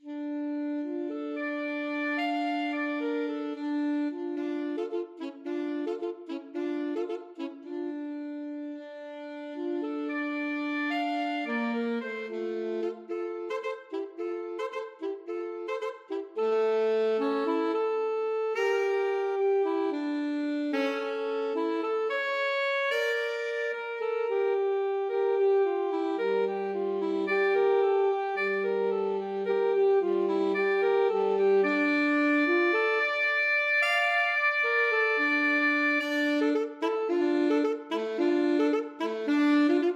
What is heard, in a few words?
alto saxophone duet